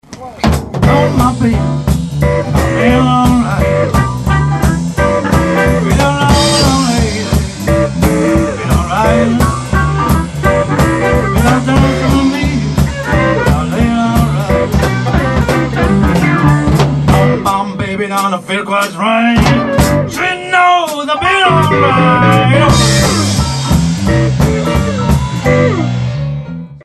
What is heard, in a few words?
Blues quartet